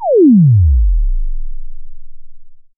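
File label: BD Sub, bass drum; Takebeat; Club; VEC1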